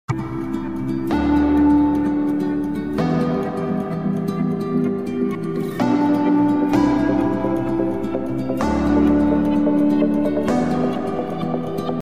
song remix, bass

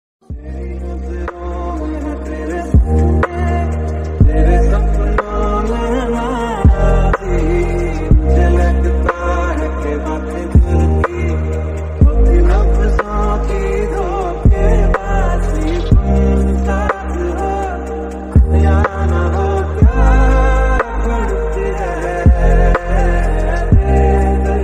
love ringtone romantic ringtone

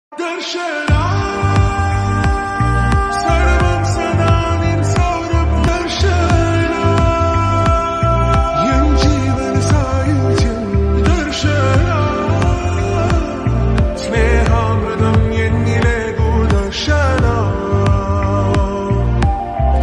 song slowed reverb; hridayam movie

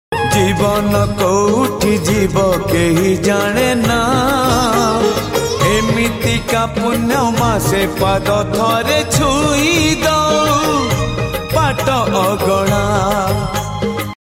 jagannath bhajan

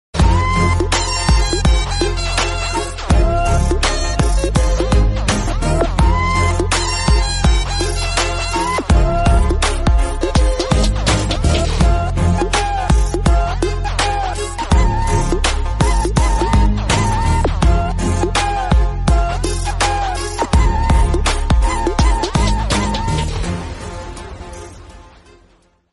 bgm phone ringtone romantic ringtone; jati ratnalu

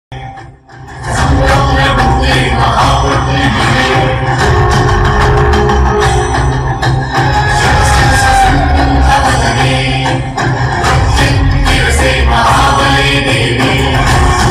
climax bgm ringtone; kalinga movie

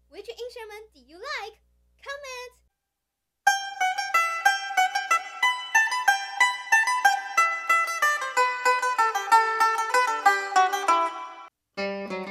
carol of the bells 4 instruments christmas music

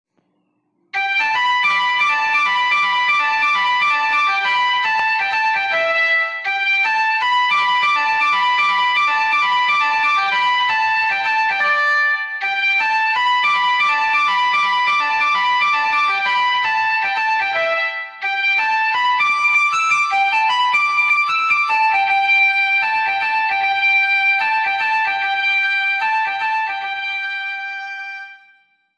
flute cover bgm; mudhalvan

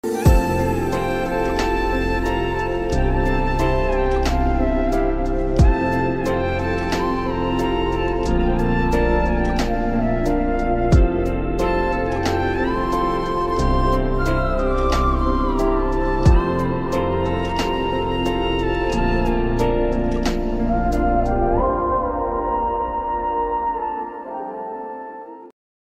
beautiful flute flute music; ganesh

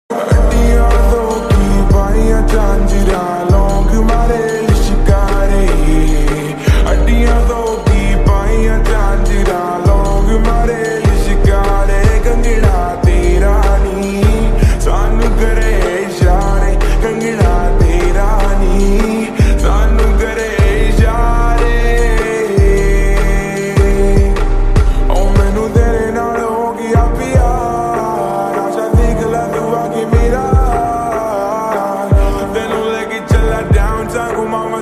slowed reverb new ringtone

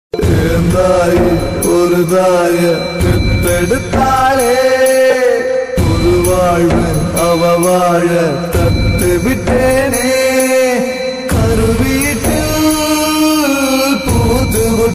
tamil feel song; Thirupachi movie

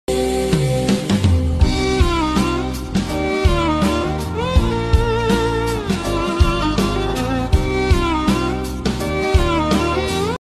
sad love bgm

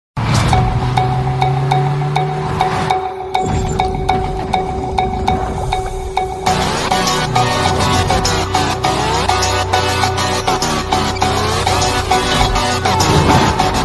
theme background original score bgm; the legend

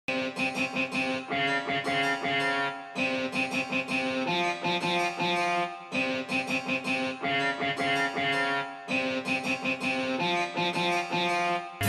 instrumental ringtone music bgm; kantara

